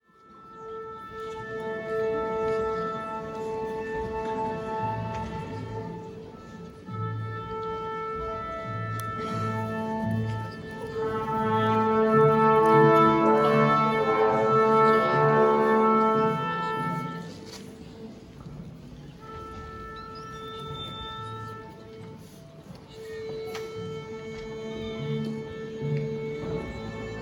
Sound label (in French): Accord Orchestre